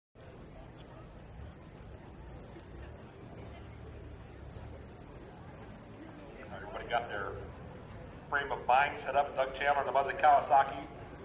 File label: Motorcycle Race, road racing